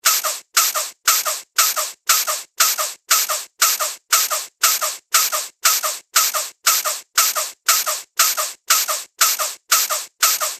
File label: Cartoon Repetitive Pump; Cartoon Sound Design Sound Effects